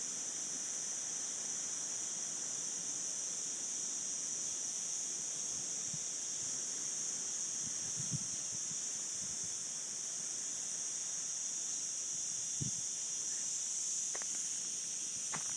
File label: sounds like buzzing electricity lines but in fact a pine tree insect